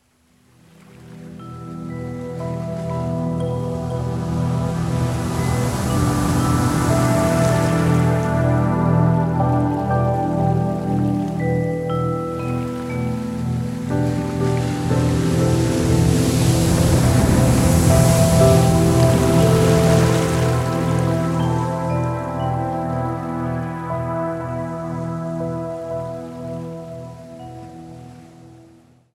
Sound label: hawaiian dream; sound oasis